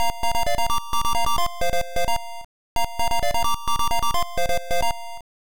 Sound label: March Trumpet; family computer; Metal Max